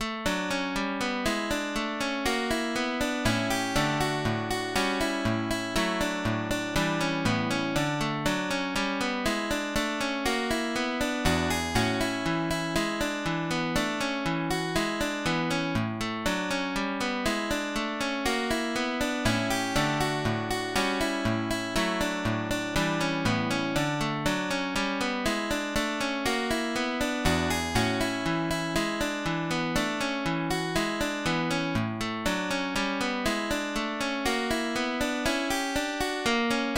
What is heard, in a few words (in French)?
gamme de re; Jacques Bosch